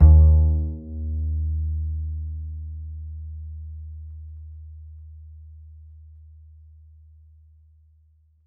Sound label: D, Upright Acoustic Bass; 1ST POSITION; Larry Seyer